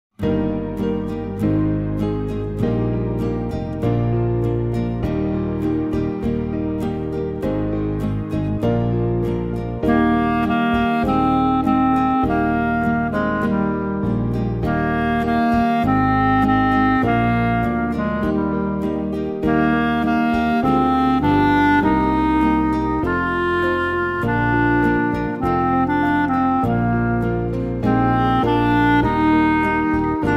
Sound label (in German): klarinettentaxi